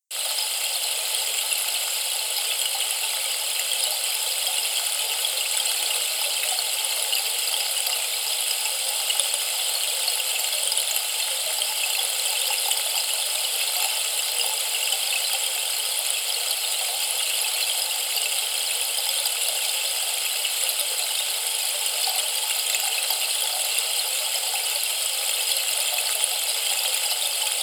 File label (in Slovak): dažďový stĺp 90 cm; topol